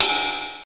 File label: cymbal ride; orchestra, drum